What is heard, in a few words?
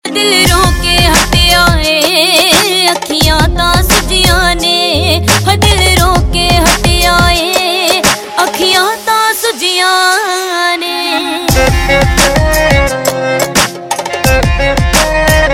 Ringtone, Punjabi